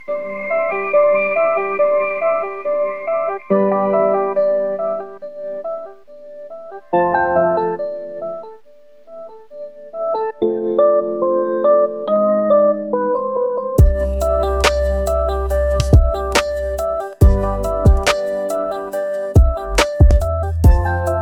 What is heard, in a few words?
rainy lofi